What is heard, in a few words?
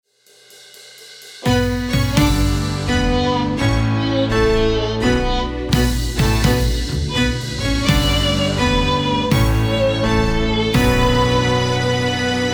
A Christmas Song